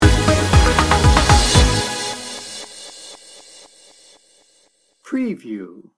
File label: party chips sting bumper